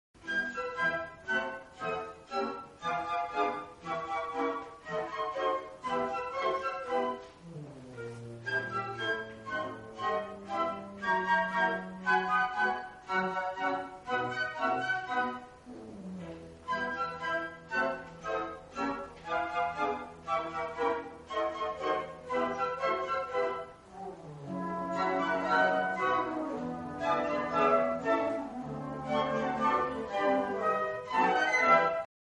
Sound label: concert, flute